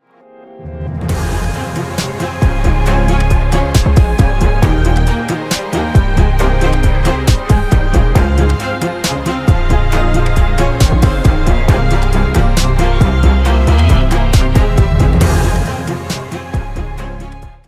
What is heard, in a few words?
Instrumental